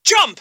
jump; potry v2; shulk

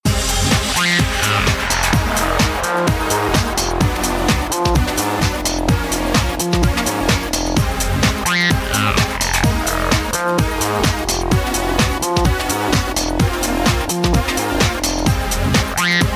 typing, music bossgame; tf2ware ultimate

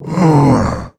attack, male zombie; nmrih; zerosiki; slender

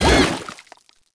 spider slow shot; granny; zerosiki; slender

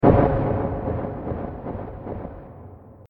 step; smokey; scp cb; 106; slender

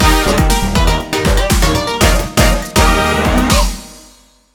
game clear; tf2ware ultimate; music game; switch moveit wario

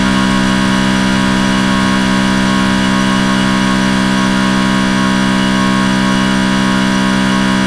high, vehicles; nfs heat ganimator pack

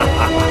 laugh; freak fortress 2; armstrong

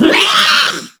shout short; scarlet; slender

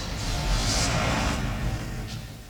idle loop

